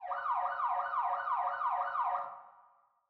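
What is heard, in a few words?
ambience Police Siren; netent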